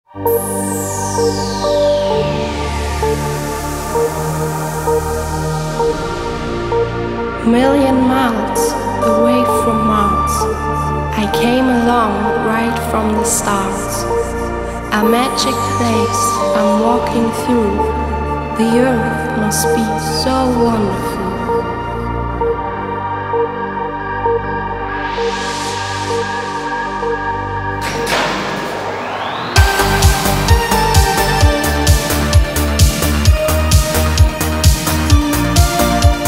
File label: italo disco